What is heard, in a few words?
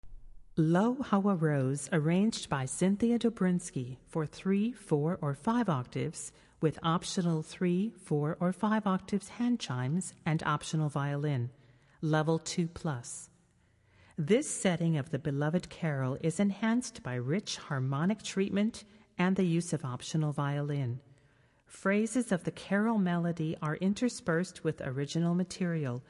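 Handbell Music, Handbells 3-5 Octave; Lo How A Rose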